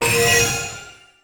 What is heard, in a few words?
powerup; signal to noise